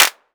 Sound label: studio clap sound g key; snare drum alternatives